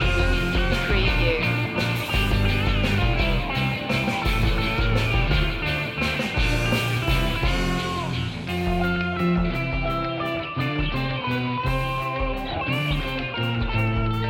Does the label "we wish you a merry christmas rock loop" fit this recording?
Yes